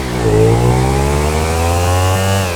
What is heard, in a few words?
second, vehicles; gmod; tdmcars; gtav; nemesis